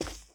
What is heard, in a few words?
Foot carpet; We Happy Few